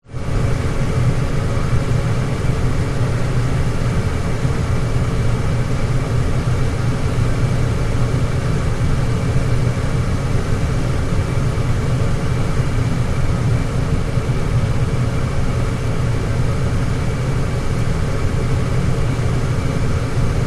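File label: Electric Furnace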